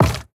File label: bone drop; ui; inventory